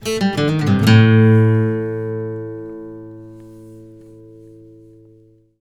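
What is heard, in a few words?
baritone lick A, Blues Guitar; ILIO; Fretworks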